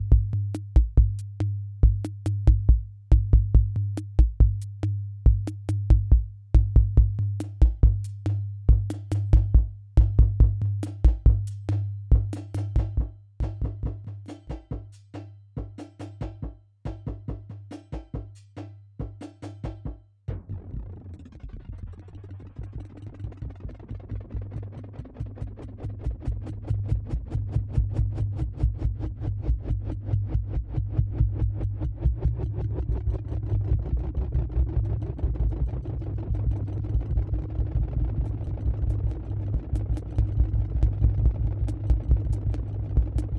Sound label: Drum Machine; Ventris Offspring